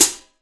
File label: FLANGE 14 HH